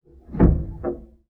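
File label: Ped Noise Etc Single Push, Piano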